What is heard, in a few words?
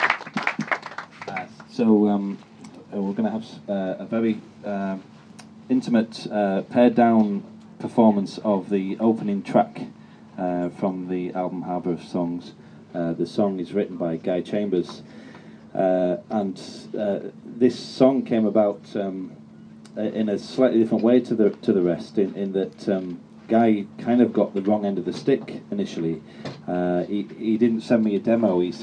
acoustic moment; raevennan husbandes